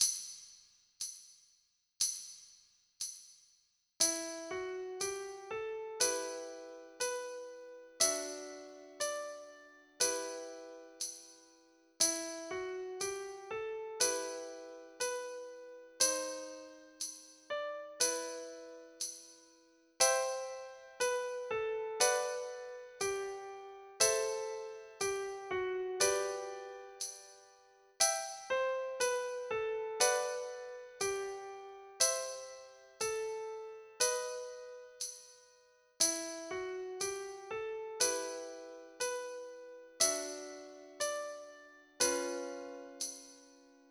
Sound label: A Little Song Slow 60, Pianoforte